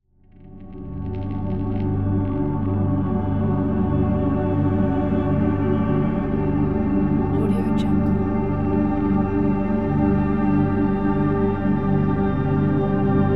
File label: deep dark background